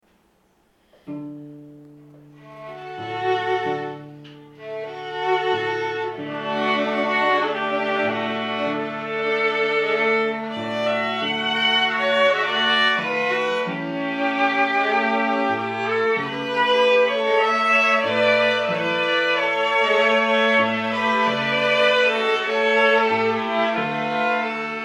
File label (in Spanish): Tango Albeniz, cuarteto